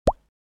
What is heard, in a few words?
Mouth pop